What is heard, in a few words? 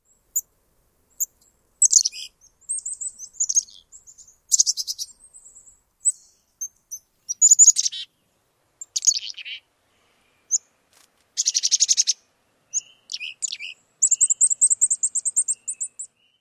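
Black-capped Chickadee many additional calls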